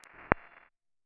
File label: mf05 Imp Resp